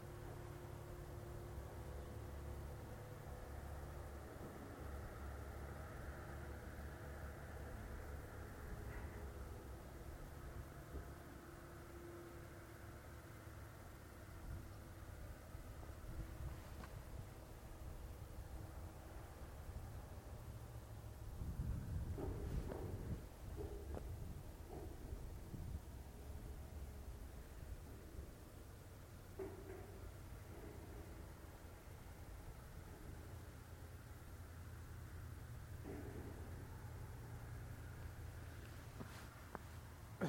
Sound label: cannon fire